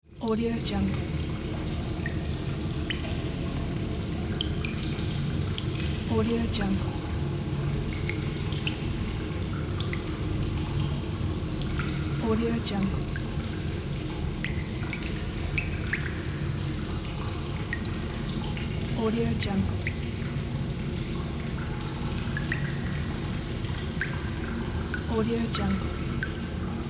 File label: sewer ambience